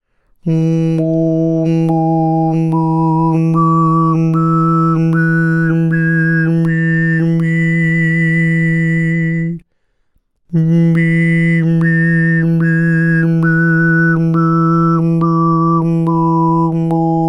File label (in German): obertonleiter h4 bis h12 bird fast